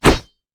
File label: basicattack; pantheon